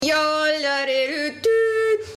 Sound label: climb jodel